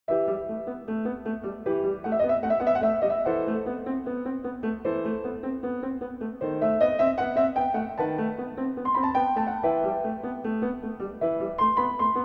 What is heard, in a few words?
Prelude in E Minor; BWV 855; Bach; Well-Tempered Clavier; WTC I